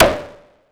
ANA WN PERC, ANALOG PERC; Zero-G Ambient 1

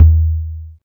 Synth Kick

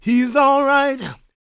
gospel male, vocal; ILIO; Vocal Planet

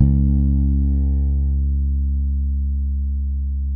FRET FNG C#2, PEDULLA BASS; Masterbits CLIMAX 7 - Bass

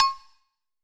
agogo; Greytsounds; Scott Peers Sound Engineering

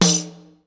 TAMBOURI.HIT; Best Service; World Colours

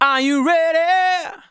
vocals sung F#; Techno Trance Essentials

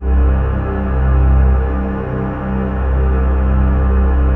AMB LO RES; Synclavier Sampler Library; KEYS AND GUITARS

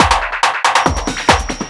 Swingin at 140 turnaround, Snare Fills; Drums

